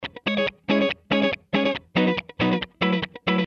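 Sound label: Ska Guitar; Loopology; Ska Till Ya Drah; Lounge & Reggae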